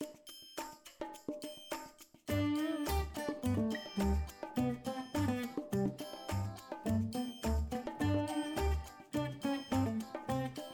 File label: music cheerful; bgm